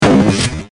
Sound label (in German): schuss verfremdet